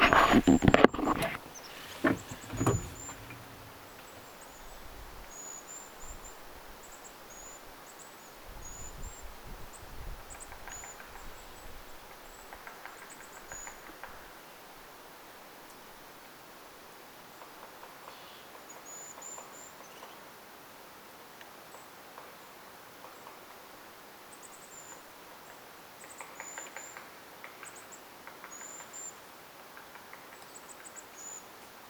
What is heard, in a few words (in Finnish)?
ilmeisesti nuoren toyhtotiaisen korkeata aantelya harvinaista